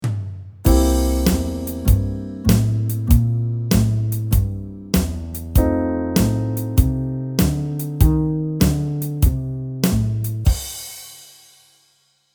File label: No Bass